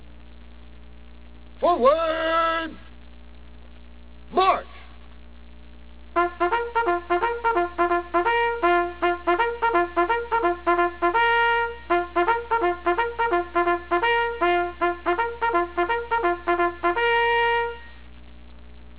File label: forward, bugle sounds